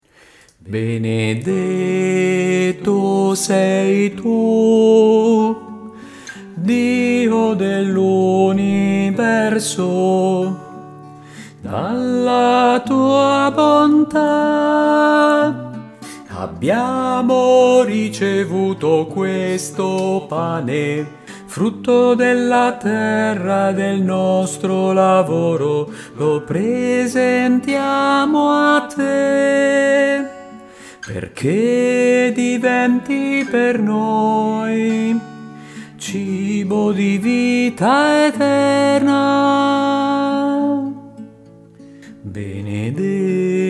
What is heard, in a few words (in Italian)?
benedetto sei tu, soprani